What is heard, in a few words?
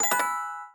Unlock